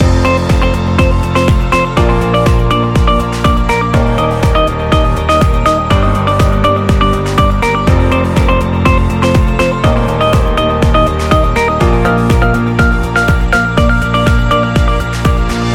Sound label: Corporate Upbeat Loop; explainer